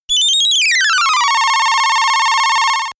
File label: digital energy charge; controller; comedy-cartoon; soundpool